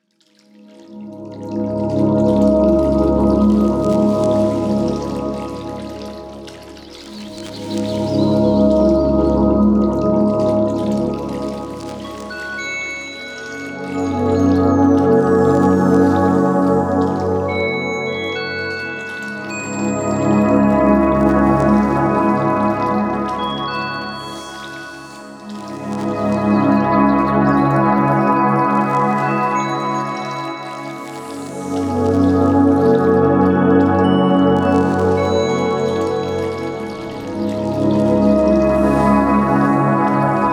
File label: Meditation